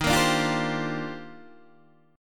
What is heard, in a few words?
D sharp Major 13th D sharp Guitar DADGAD, chord